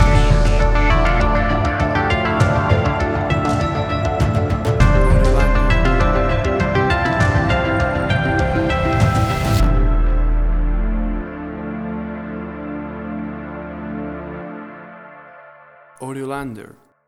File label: Big Impact; Big impact suspense real